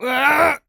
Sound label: Male Grunt Shout; Screams Shouts 2; soundbits